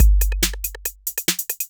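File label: DRUMLOOP ELECTRO 140; Techno2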